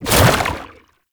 Proto Infested Brachiolyst Projectile Whizz By; Warframe; Abilities

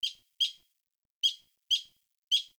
Tree Chipmunk; Warframe; Ambience; Nineteen Ninety Nine

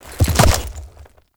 Enemy Horse Stomp; Duviri

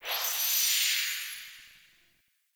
Spawn Machine Item Disintegrate; Echoes; Warframe; Entrati

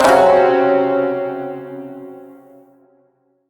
Shawzin Hirajoshi Chord D; Chords; Warframe

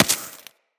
Laser Impact Generic; Weapons; Bullet Impacts; Surface

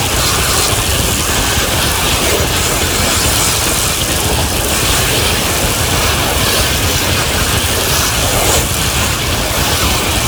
Tengu Tornado Loop; Warframe

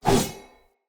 Short Sword Whoosh; Enemy; Weapons; Short Sword Elite

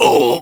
Pain, Barks; Kuva Lich; Persona D

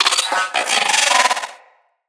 Riot Moa Vocal Attack

